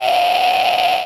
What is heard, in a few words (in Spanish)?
Voz tenor ord fff